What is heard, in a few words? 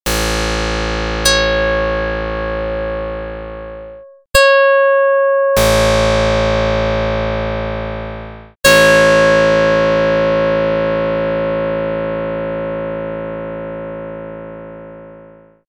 pluck; Jid 10 1; dr55